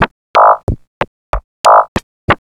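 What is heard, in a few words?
rhythm loops, 93 bpm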